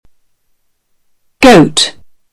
goat

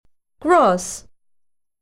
grass

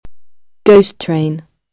ghost train

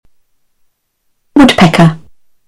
woodpecker

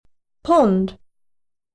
pond

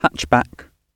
hatchback

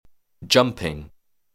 jumping

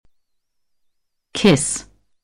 kiss

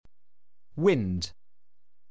wind

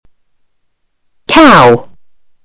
cow

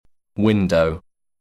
window

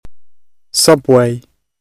subway

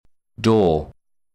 door

